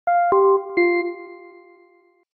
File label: Clock, notifications; LG Swift L9